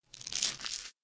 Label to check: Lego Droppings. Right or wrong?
Right